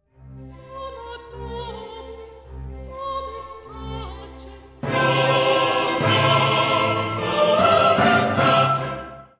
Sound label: Agnus Dei Dona Soprano